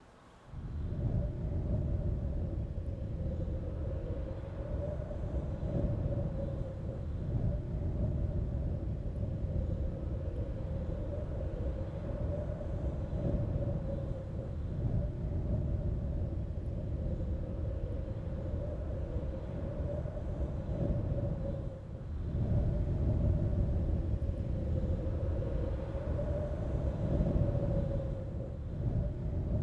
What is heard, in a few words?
Cold Breeze Ambience